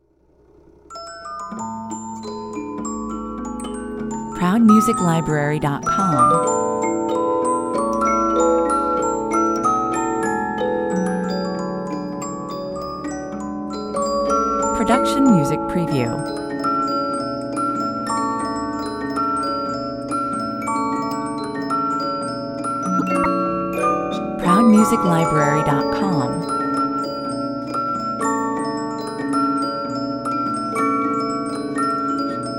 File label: magical music box